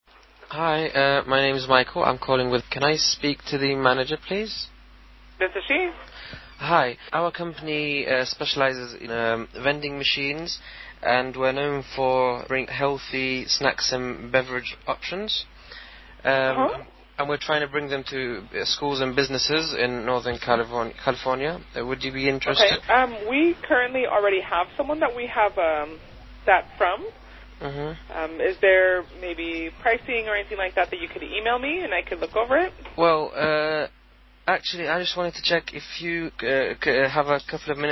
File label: Vending Machines